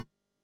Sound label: ball bounce; Sports & Arcade; freepixel